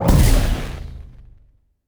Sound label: Sci Fi Explosion